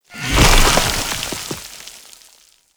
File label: Sand Kavat Dissolve; Warframe; Mummy